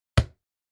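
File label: kick in football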